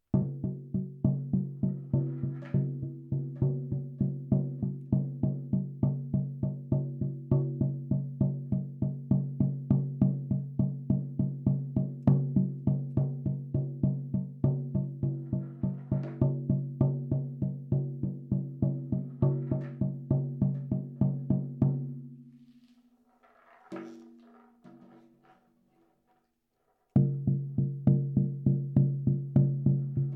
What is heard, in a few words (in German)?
trommel hirsch doppelte avatartrommel